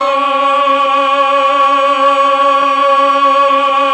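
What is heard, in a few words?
Mellotron choir C4; MelloChoir; Oldies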